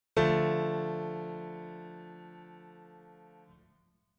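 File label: minor triad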